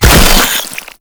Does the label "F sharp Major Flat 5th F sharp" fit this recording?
No